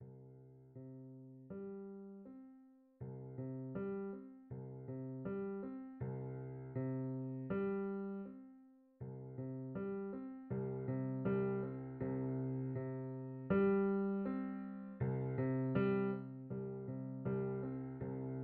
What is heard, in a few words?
bass piano and sine waves